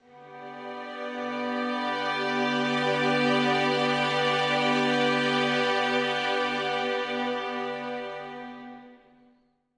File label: Loop String Swells Gm 2nd Inv